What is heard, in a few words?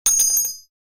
cart